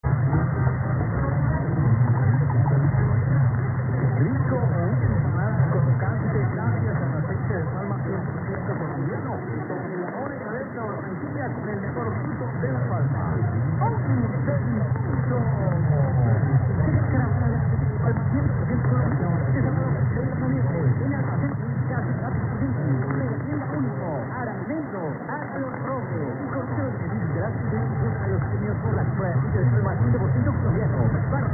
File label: 760kHz